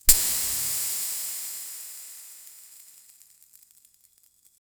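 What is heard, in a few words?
firework pop boom confetti; samba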